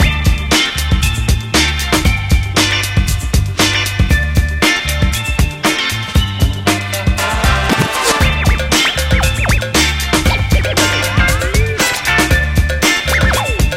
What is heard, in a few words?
Move